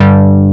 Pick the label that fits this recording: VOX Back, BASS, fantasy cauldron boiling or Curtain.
BASS